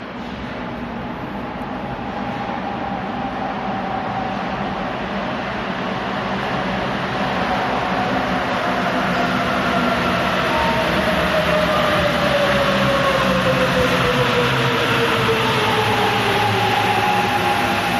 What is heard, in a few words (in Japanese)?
札幌市営地下鉄東西線 新さっぽろ行 バスセンター前駅構内 / 車輪がタイヤなのでガタンゴトンという音がしない